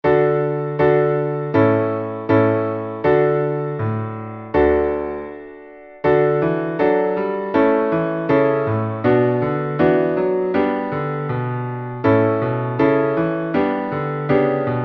D Dur Tonleiter schnell Klavier

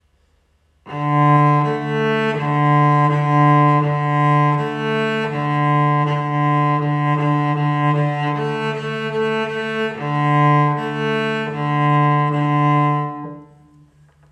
arco Cello

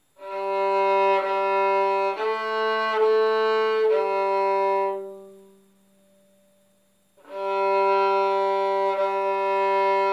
Geige arco

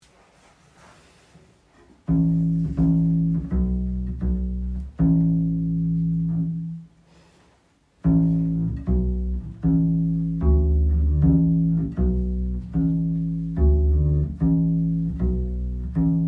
Wind an Bord Bass pizz

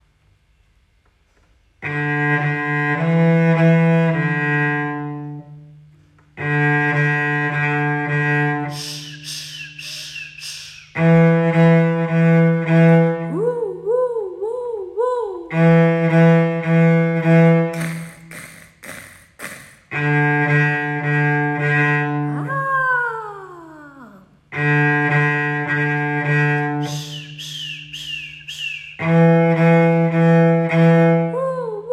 arco Cello

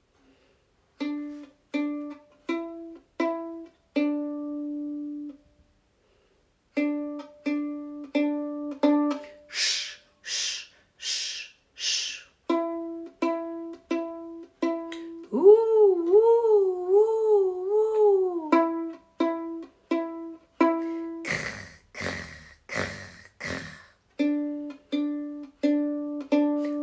Gespenstertango Bratsche pizzicato

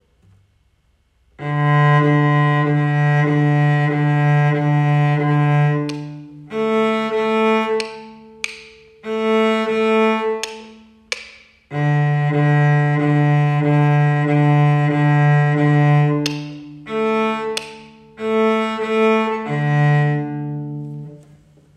arco Cello

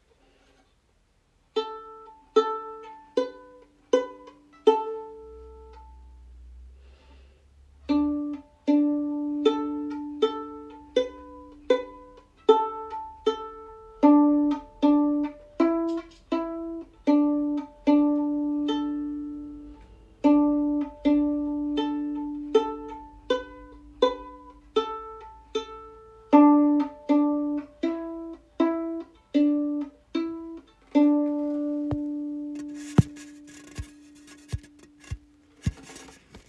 Bratsche pizzicato

